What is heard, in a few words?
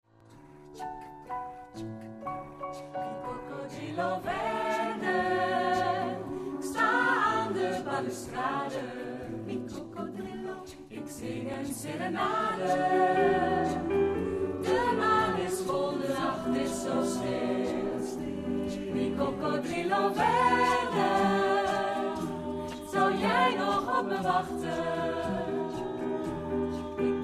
ssatb